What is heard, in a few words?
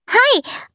match start; Barely still Jace